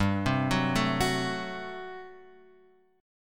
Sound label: G Major 7th Suspended 2nd Suspended 4th, chord; GM7sus2sus4 Chord {3 3 4 2 X 2} arpeggiated